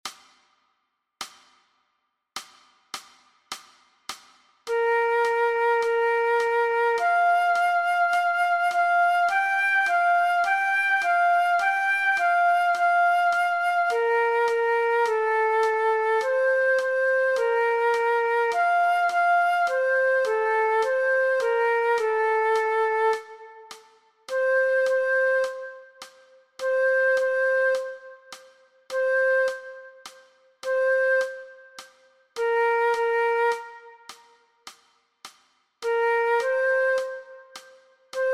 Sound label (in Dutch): Meespeeltrack Dwarsfluit; Dwarsfluit aangepast; Nij Talint Orkest